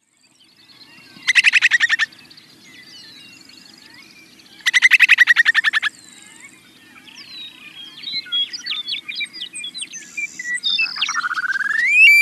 Upland Sandpiper

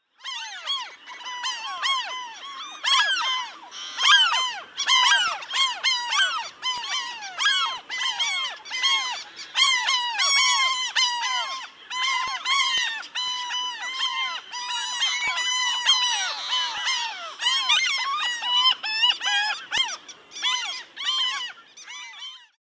Franklins Gull

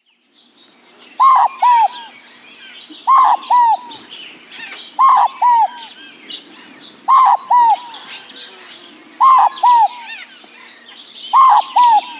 Inca Dove

